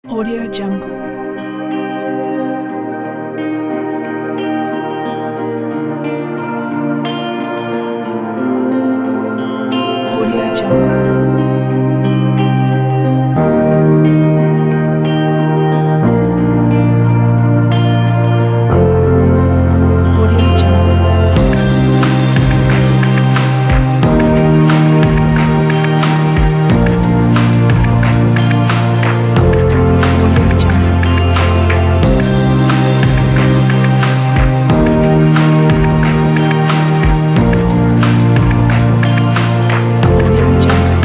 abstract motivational inspiring cinematic indie

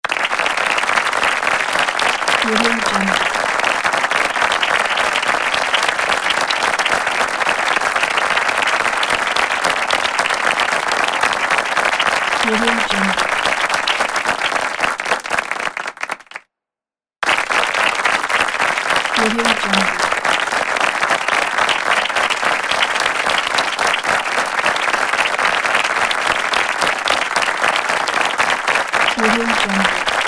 clapping

